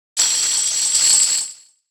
win; HeavenStudioPlus; slotMonster

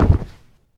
player falling damage; Mineclonia

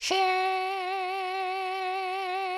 roll C; HeavenStudioPlus; board Meeting

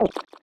gulp; HeavenStudioPlus; forkLifter

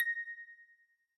note, tambourine

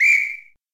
whistle; Rhythm Rally

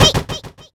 throw; Heaven Studio Plus; pajama Party

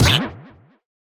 fail groan; HeavenStudioPlus; flipperFlop

